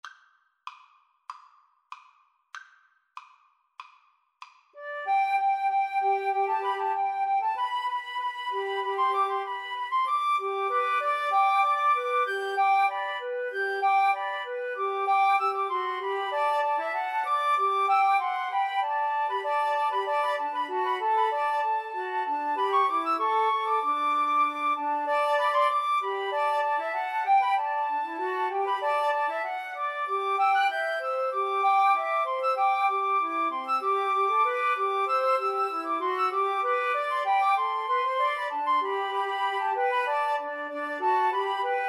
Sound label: rejouissance, Flute Trio